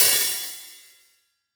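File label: close Open Hat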